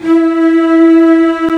Cellos; CMI

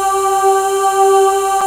Choir; CMI

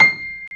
Piano Grand; CMI

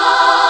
MEN CHOIR; CMI

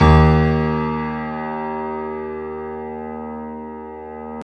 Piano Grand; CMI

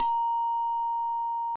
Glock; CMI

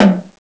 AH BIG DRM; CMI

